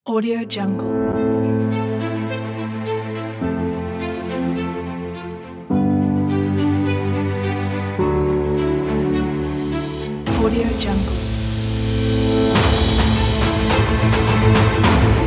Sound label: epic background ident pack